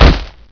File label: vort foot; Source Engine; video game; npc